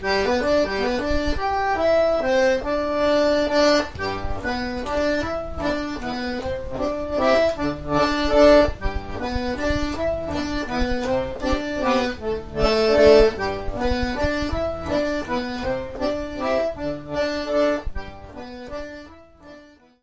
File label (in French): Valse, France; Valse d'Egreves